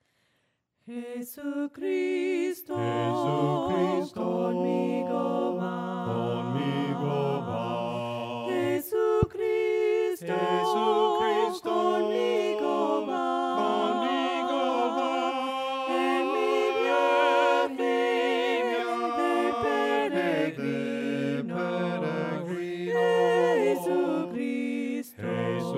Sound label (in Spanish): VOCES, himnos a capella; cantos del camino